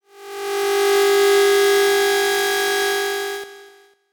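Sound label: buzz medium pitch psychotic; sound design; buzz med pitch pyschotic; zapsplat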